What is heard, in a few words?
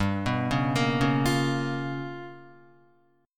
G 7th Sharp 9th Flat 5th, Guitar; G7#9b5 arpeggiated; JGuitar